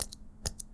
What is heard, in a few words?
Mech Pencil Click; bb - Books, Paper, Writing